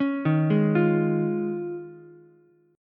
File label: D sharp 9th Suspended 4th, Ukulele; arpeggiated; 6,3,4,8; JGuitar; D#9sus4 Chord